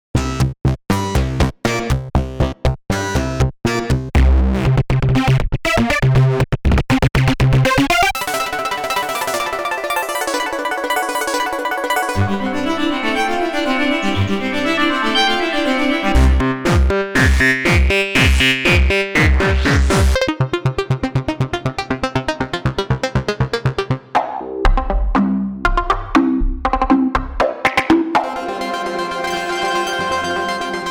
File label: wiggle presets seq